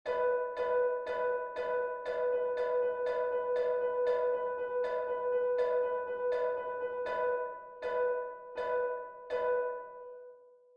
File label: tempo change